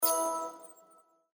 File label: number roll Up end; Champions of Valhalla